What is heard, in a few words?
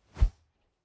Fighting Woosh; SoundsCrate